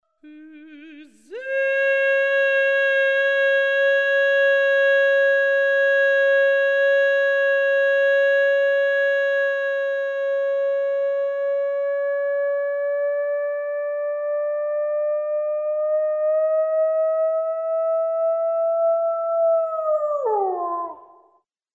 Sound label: morphing